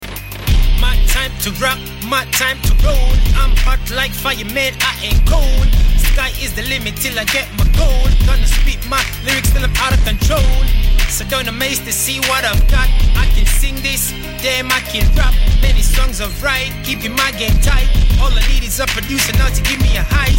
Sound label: ROCK AND ROLL